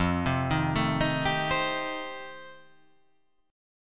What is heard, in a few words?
F 9th F, chord